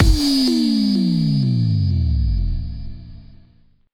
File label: Hallkick; FX; Vengeance Samples